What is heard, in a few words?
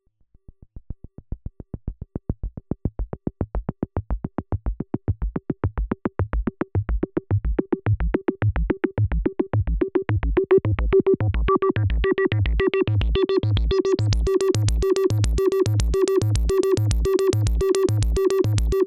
Erica Synths Pico System 3 Snappy Sequence